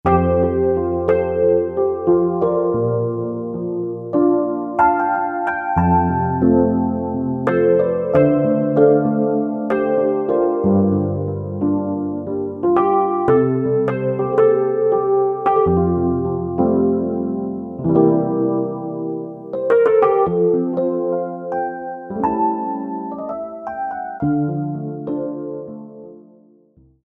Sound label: SC RHODES, Roland SC-880; Factory Patches